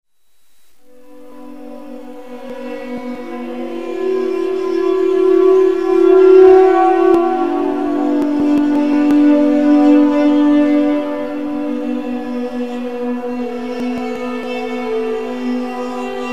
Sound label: cello bow chime